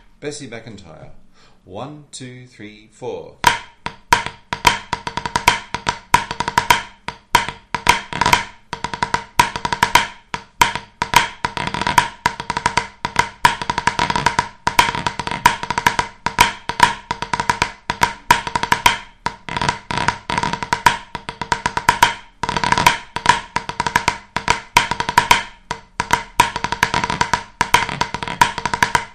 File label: pad